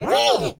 Banshee Attack Vocals

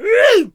Shield Bearer Attack Vocals

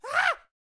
Poacher Death Vocals

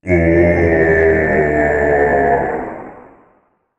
Reaper Death Vocals

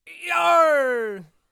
Bomb on a Stick Attack Vocals

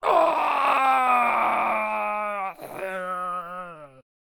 Headbutter Death Vocals

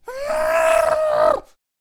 Death Vocals

